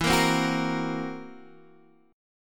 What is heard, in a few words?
F sharp 7th Flat 5th E